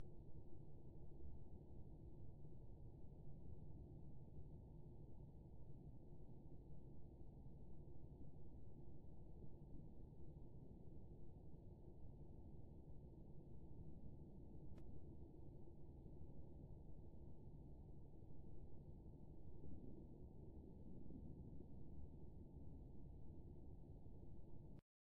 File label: woodpeckers